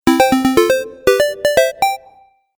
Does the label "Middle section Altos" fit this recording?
No